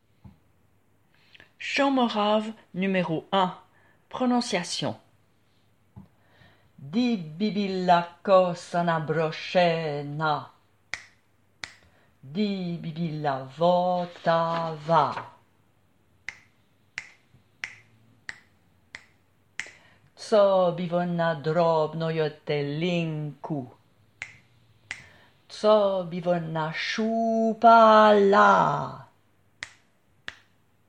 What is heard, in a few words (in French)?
chant morave